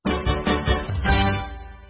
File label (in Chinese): 铁匠 胜利音效; Blacksmith